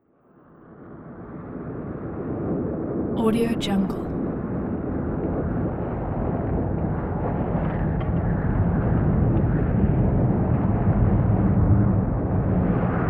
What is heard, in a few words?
haunted house atmosphere